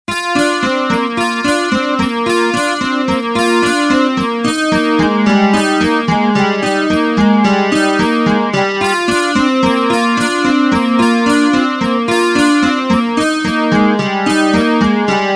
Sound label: acoustic ringtone